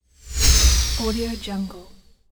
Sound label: sweep transition